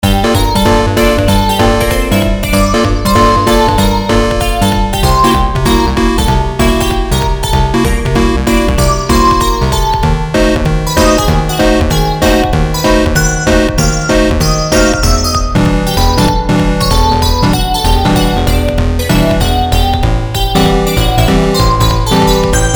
Bebop (Chiptune)

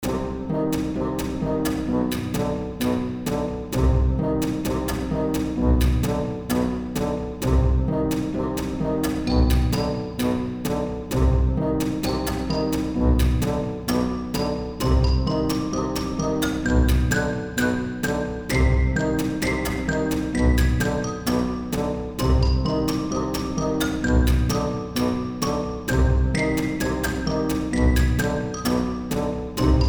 Rising

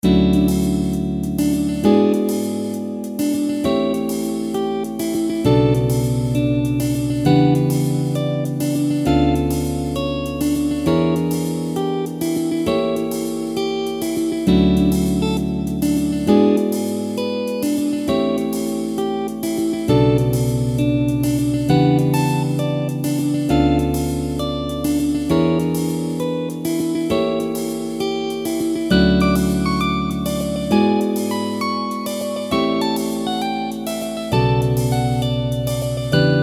jazz

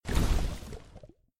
acid burn small

